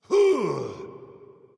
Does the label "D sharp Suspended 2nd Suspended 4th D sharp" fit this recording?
No